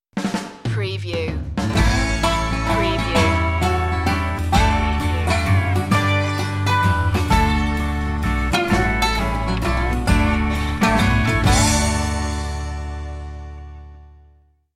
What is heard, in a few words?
goodnight waltz stinger